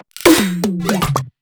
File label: Pattern consolidated, fills